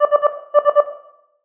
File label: Glossy phone